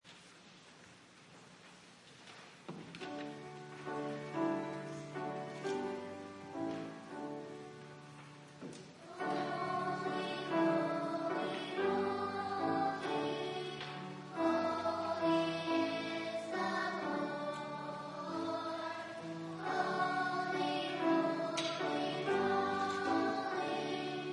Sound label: worship children